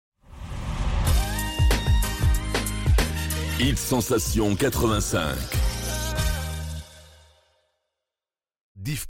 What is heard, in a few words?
Sweep ID; Hit Sensation 85